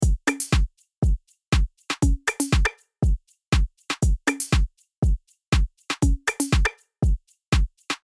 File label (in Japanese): グルーヴOFF